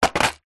household furniture wooden drawer removed small metal jewelry inside set down; zapsplat